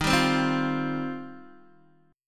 D sharp 6th Add 9th, Guitar, Open D

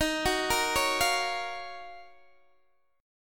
Eb Minor 9th, down, Guitar, DADGAD; arpeggiated; chord; JGuitar

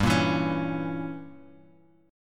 G Diminished 7th, Bass Standard; strummed; JGuitar

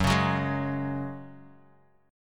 Gb Suspended 2nd Gb, chord; strummed; 2,4,4,1,x,x

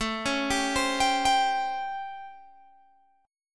A 7th Sharp 9th, Guitar, Open G; 19,18,17,17,20,17

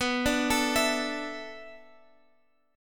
B Minor 11th B down Guitar Open F; arpeggiated

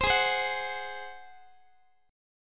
Ab 7th Ab down Guitar; x,x,15,18,16,17; strummed

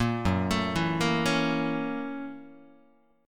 F sharp 7th Sharp 9th F sharp down Guitar; 4A,4E,5A,5D,5Gsharp,6Csharp; arpeggiated; JGuitar

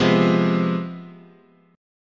C Major 7th Suspended 4th Sharp 5th, guitar chord

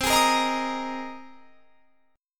C Minor 7th Sharp 5th C; Cm7#5 strummed

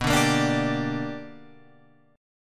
B-Minor Major 7th Sharp 5th B down Guitar Open F; BmM7#5 strummed; JGuitar

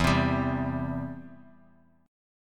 E Minor Sharp 5th E down Guitar Open D

